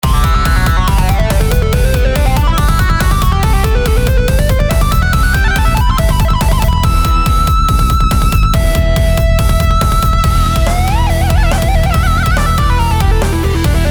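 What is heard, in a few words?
Prog Rock, live instruments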